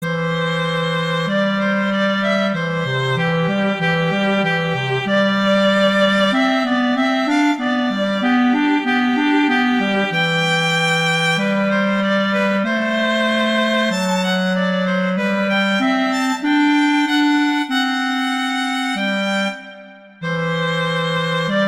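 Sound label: Duet, horn; Mozart; Duets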